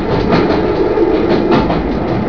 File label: RAIL CAR; TRANSPOR